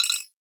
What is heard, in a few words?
Comp Beep; Ambience; Warframe; Tenno Hub